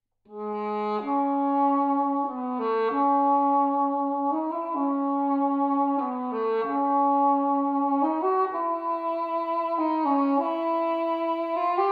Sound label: Heckelphone